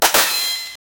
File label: jump in platformer game